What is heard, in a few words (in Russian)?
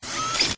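машины открытых дверей научно-фантастического машиностроения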